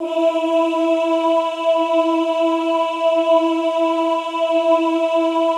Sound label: OOH E3, Classical Choir; Best Service; Extended Classical Choir; OOH MIX